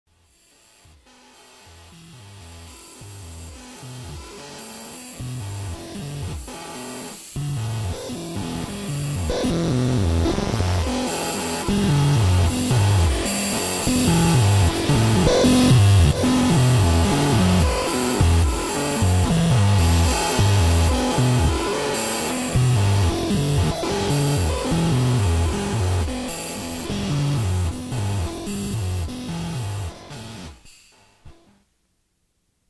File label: MIDI Feedback